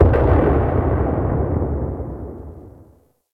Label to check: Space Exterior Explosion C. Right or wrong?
Right